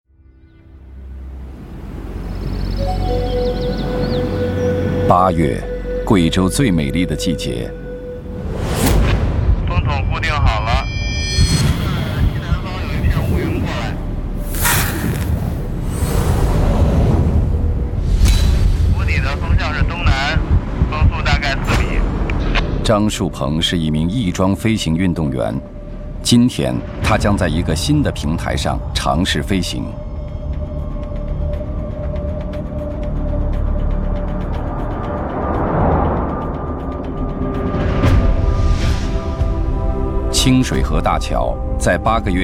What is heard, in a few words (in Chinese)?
深度音乐音效; 超级工程